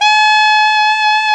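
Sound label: SAX ALTO mezzo piano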